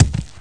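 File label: body fall medium; The Elder Scrolls III - Morrowind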